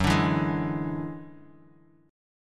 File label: F sharp 7th Suspended 2nd Sharp 5th, Bass Standard; F#7sus2#5 strummed